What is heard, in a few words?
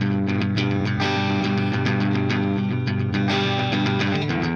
Clean Funk Guitar